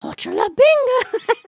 excited, emotes

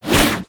Massive Spear Swing

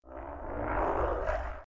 Roar, creatures organic

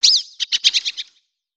3D Birds Swallow; ambience; Ninjago

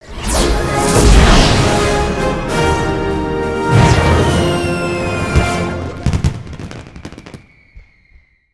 Celebration; Sentinel; aronwk collection; ndaudio

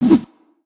jump

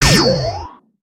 Repulsion Wand Fire; weapons; aronwk collection; ndaudio

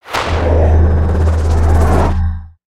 Lunge Attack; Valiant Knight

